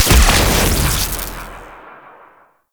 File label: Leg Electrical Burst B; Enemies; Weapons; Warframe; Arachnoid Camper